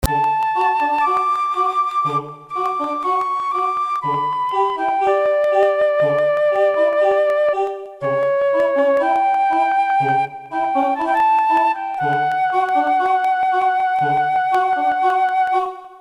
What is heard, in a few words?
Loop